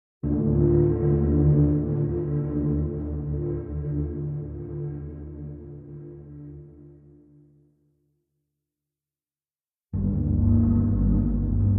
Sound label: Chords